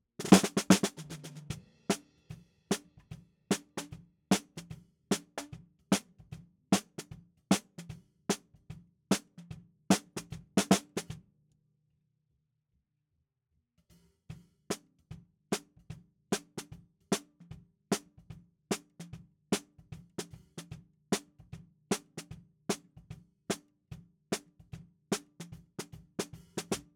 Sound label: SNR BTM